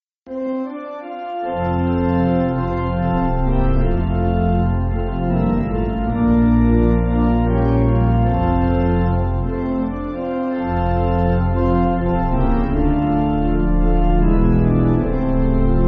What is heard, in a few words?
Pipe